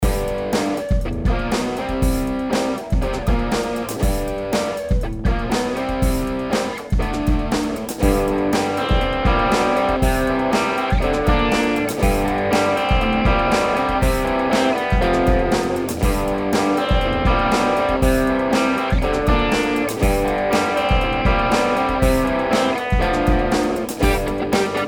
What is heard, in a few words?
Boss SD1 Overdrive Pedal; Beginner Overdrive Pedal Demo